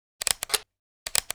camera shutter classic

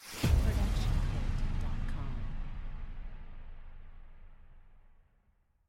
Cinematic Hit

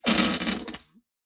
Fart Noise; SoundsCrate

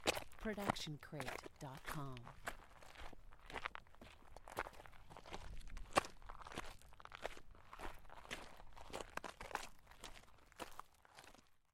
loopable footsteps pebbles; soundscrate

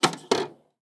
VHS Handling; SoundsCrate

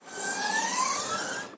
Robotic Pneumatic; SoundsCrate

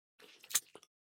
lick; soundscrate

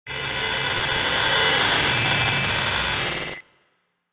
alien broken signal

